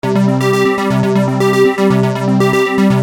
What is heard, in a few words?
Arpeggio 120 C, Techno Synth Loops; 120bpm; Sonic Foundry (Sony Creative Software); Signals I